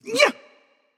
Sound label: Grunt Hit; smite; anhur